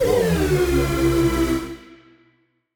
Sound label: Siren Horn down, Ramp Down, C; SirHornA; future rave